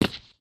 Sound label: concrete, human step; test concrete